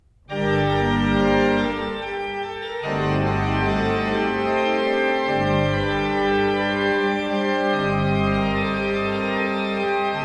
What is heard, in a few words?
pipe organ